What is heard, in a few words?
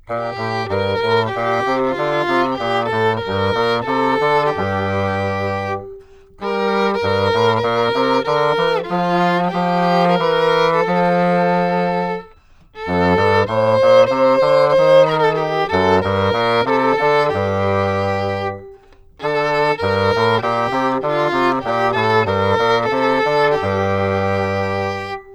Bach Chorale